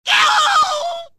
aaaagh

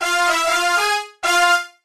text enter

